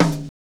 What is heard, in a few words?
TOM; E-Lab; X Static Goldmine 1